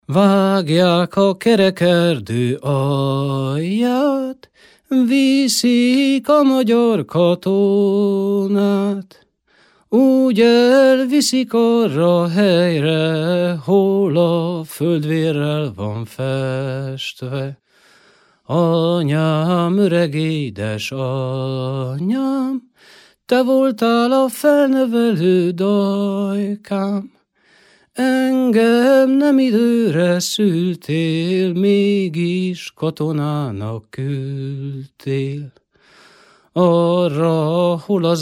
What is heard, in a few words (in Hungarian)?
nepdal; vagjak a kerek erdo; nepdalok